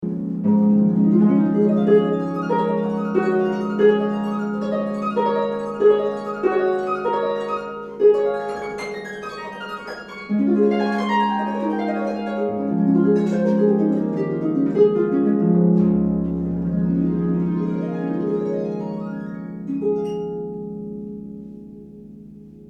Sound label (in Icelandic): harpa